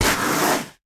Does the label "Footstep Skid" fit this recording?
Yes